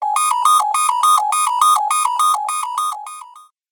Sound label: alien long